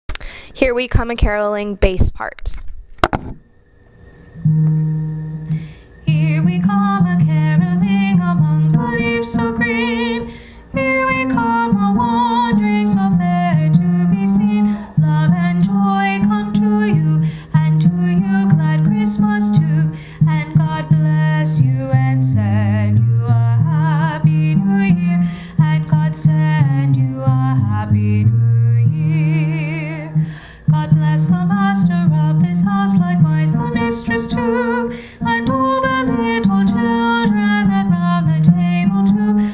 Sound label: here we come a caroling bass, choir